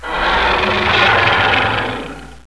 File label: DINOSAUR